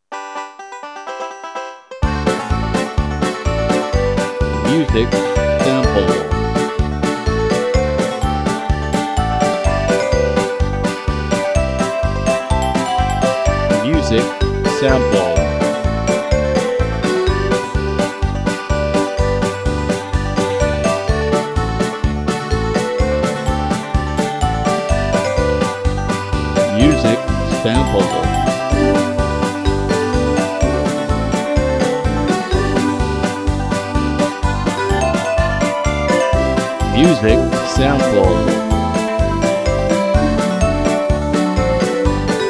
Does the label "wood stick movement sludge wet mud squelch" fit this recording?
No